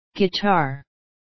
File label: guitar